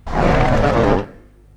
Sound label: doors